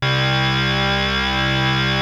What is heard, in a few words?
PPG C2, Rare Synthesizer; Hollywood Edge; Giorgio Moroder Rare Synthesizer Collection; PPG.2.3